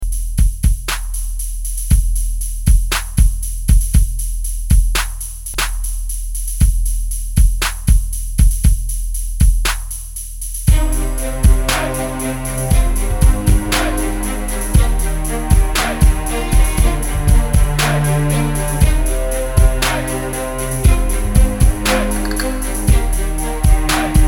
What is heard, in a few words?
118 BPM; REDRUM